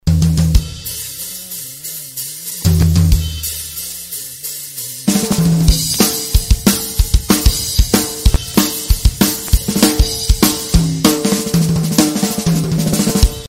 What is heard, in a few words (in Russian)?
zvuk barabanov